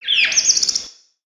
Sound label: Peaceful Bird One shot